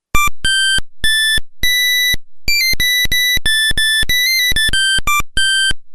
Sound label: X1